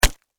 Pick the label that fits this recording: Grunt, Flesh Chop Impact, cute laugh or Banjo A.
Flesh Chop Impact